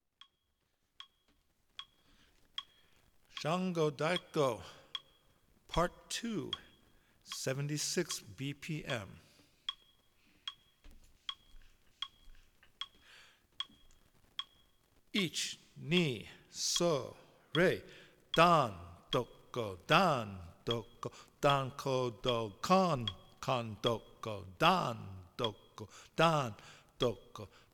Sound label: Shango Daiko 76bpm